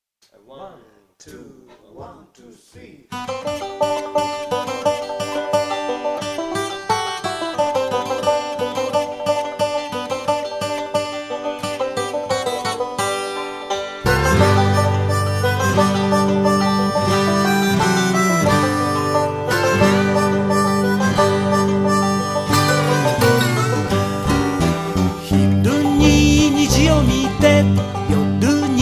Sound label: harmonics; 八木山合奏団